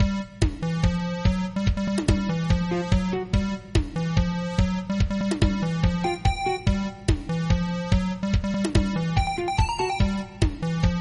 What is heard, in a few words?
crash